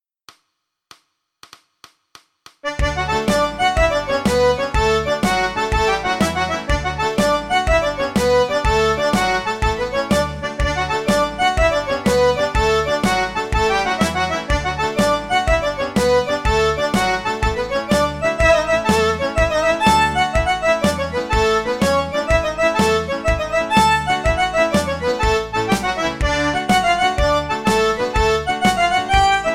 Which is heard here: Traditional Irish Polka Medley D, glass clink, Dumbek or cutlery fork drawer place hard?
Traditional Irish Polka Medley D